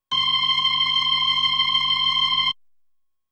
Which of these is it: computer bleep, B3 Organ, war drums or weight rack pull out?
B3 Organ